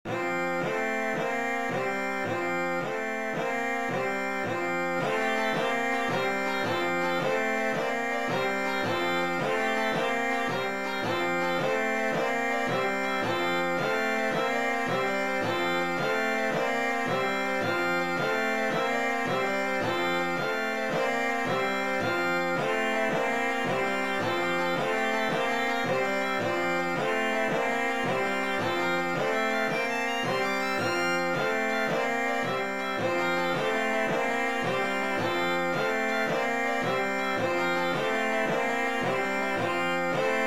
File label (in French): pour le piano ou l'orgue; gounod charles; les pifferari